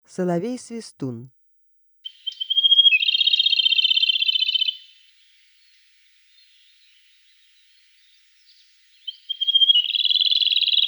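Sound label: Siberian blue robin voice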